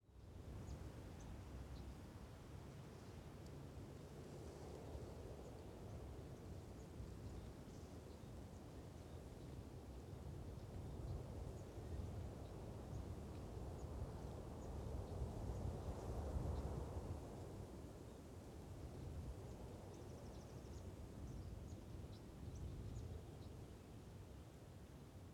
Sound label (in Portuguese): Vento Forte em Campo Aberto de Capim Alto com Passaros ao Fundo